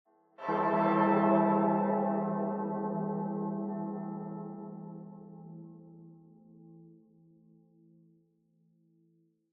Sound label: void bell ring; Minecraft